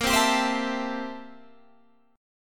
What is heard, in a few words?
A-Minor 7th Bb; Am7/Bb; strummed